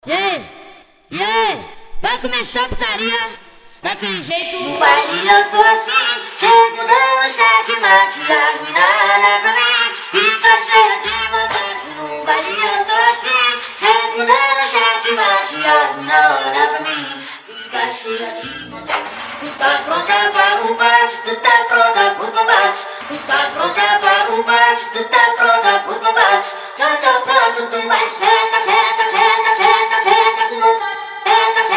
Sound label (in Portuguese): acapela